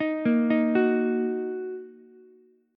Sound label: Eb Minor Eb Ukulele; Ebm arpeggiated; JGuitar